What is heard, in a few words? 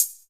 HH, Drum Mashines; EdgeSounds